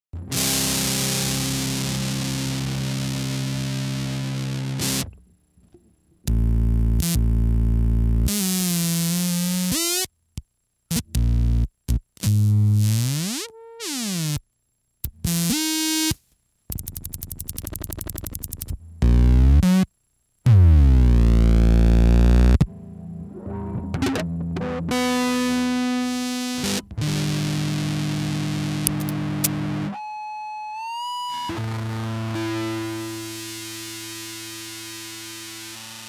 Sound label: nintendo muff